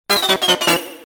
miss music

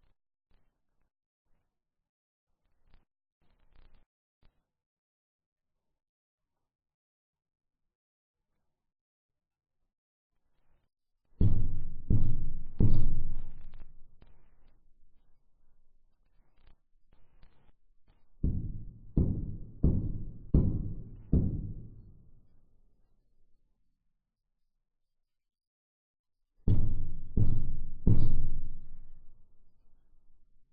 knock; vapesquared

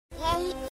yay; reverse

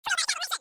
chromatic

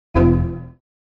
error

